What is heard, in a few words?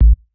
Sub, drum